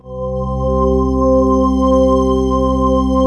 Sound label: PAD C4; Best Service Gigapack 1